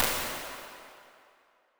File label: Vocal Plate; Large Spaces; Plate Reverbs; Impulse Responses; Apple